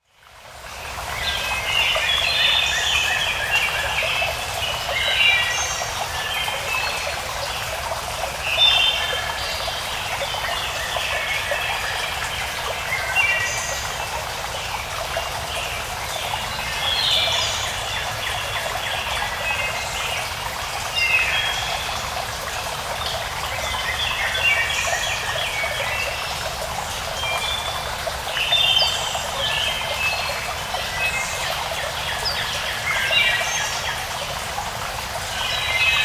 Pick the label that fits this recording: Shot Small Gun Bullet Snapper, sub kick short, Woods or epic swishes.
Woods